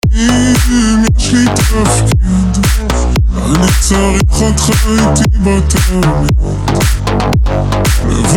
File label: remix